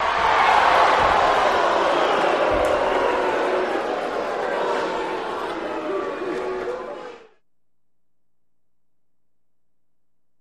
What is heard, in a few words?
Crowd React Good Guy, boxing